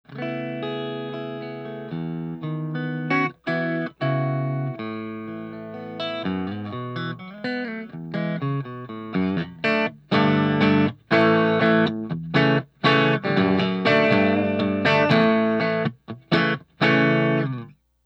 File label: clean tele